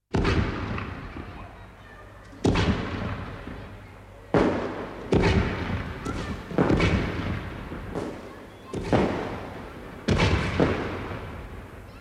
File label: Fireworks